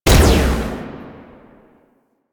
Spear Gun Shot; Weapons; Warframe; Tenno; Hoplite

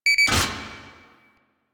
Railjack Weapon Power Ready Two; Modular Weapons

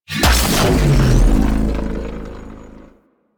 Thermal Shock Hit; Warframe; Runner

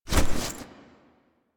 Foley Look At Figurine End; Ambience; Quest Drifter; Duviri; Warframe

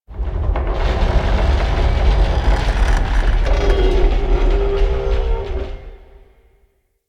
Asteroid Big Ship Groans; Ambience; Warframe; Grineer Asteroid

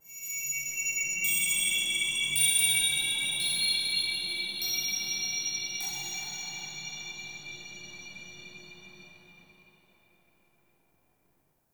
Root Cave Magical Chimes; Ambience; One Offs; Neathuns Root Cave

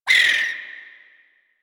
Exterior Forest Critter; Ambience; Duviri; Warframe

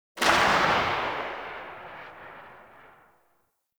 Rifle Tail, weapons; Gyre

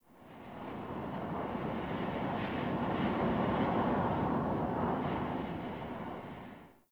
Under City Wind Heavy Slow Gust

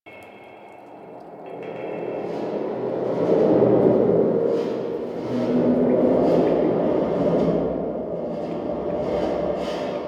Interior Sewer Bed Loop; Warframe; NineteenNinetyNine

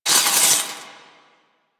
Sword Elemental Electricity Whoosh; Warframe; Teshin; Weapons

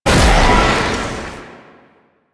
Void Launch, Weapons; Duviri; Incarnon Adapters

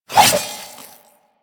Nikana Spin Swing A; Revenant

